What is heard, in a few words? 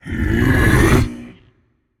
breath, voicepack; smite; jorm